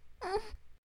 Moan

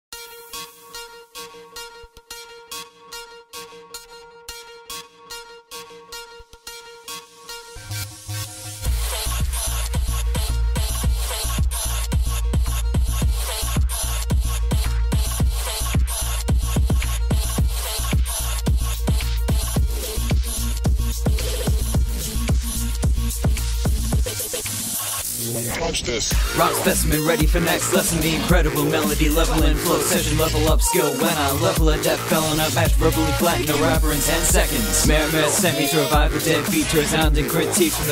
Rap

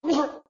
Gag; Lewd SFX

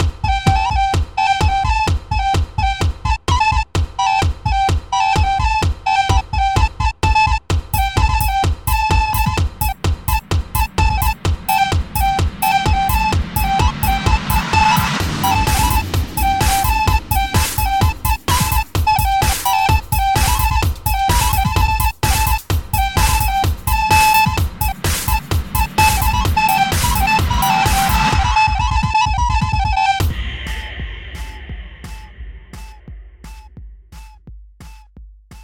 Recorder, Electro-House Remix

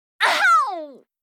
VOX Get Hit; Li Li; Heroes of the Storm; warcraft

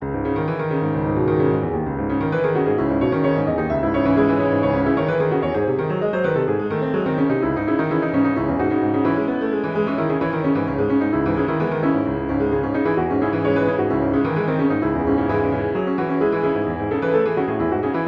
Classical House Piano Remix

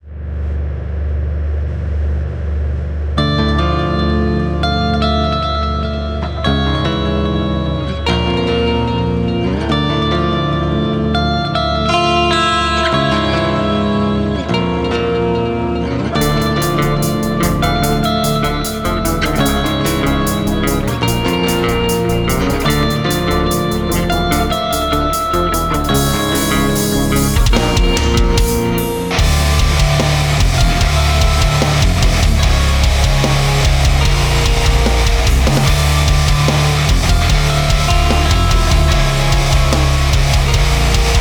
Metal Remix, Instrumental

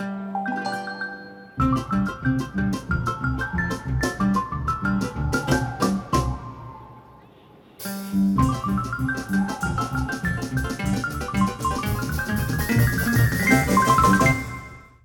Instrumental; My Little Pony OST Repository; Season 1; Bonus Music

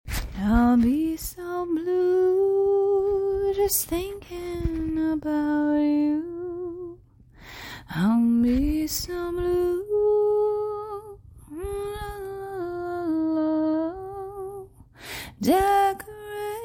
Humming

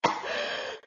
Cough; Lewd SFX

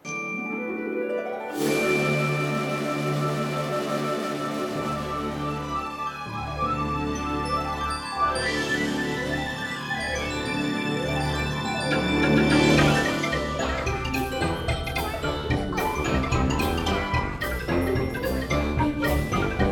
(Instrumental)

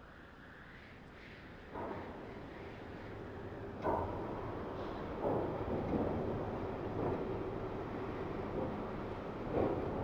Mechanical Noise; Lewd SFX

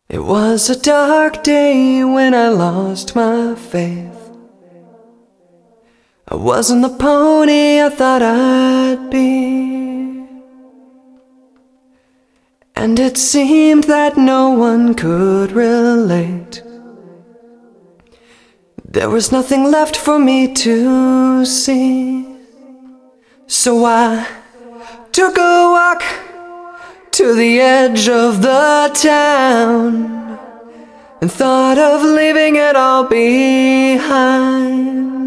Different VOCALS

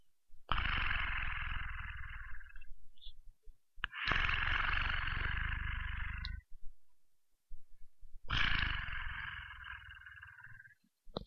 Purr

